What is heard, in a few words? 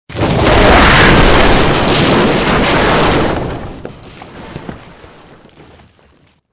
cave in; Soldier Of Fortune II Double Helix; XBOX